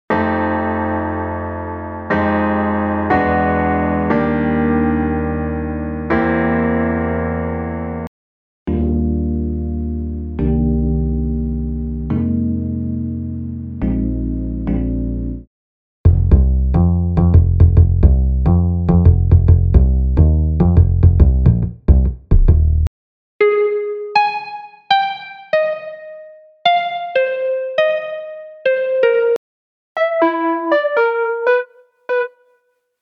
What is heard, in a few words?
Jazz; unisynth